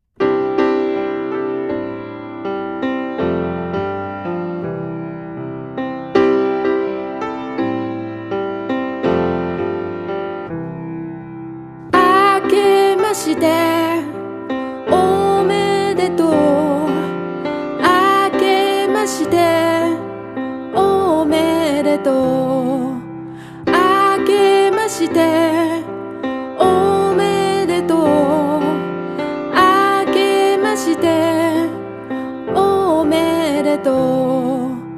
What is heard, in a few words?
japanese, songs